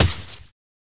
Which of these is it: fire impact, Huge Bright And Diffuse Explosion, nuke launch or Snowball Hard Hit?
Snowball Hard Hit